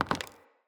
Mockery Foley Movement; Enemy; Mockeries; Characters

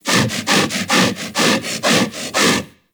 Kubrow Armored Vocal Breath Run; Warframe

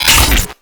Unplug; Warframe; Cinematics; Orbiter Nursery

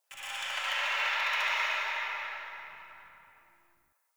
Ext Bat Chatter; Misc Outdoor

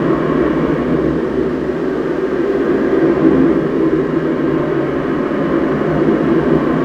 Air Loop, Ambience; Warframe; Tau

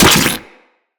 Venom Tick; Warframes; Saryn

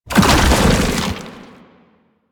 Babau Strafe, Foley; Proto Infested

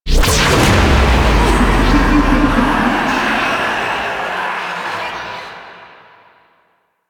Infested Scream Stinger; Warframe; NineteenNinetyNine; Stage12PartyOfALifetime

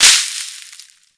Forest Pinecone; Props; Warframe; Grineer Forest

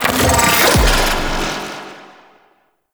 Zanuka Companion Disarm Cast; Warframe; Characters; Abilities

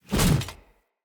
Mace Slap Whoosh B; Weapons; Enemy; Blunt; Sinecure Two Handed Mace

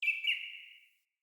Bird Double Chirp; Josalfar Bird

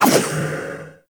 Ball Throw Foley; Warframe; Stalker

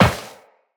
Step Metal Perforated, Footsteps Walk; Characters; Tenno

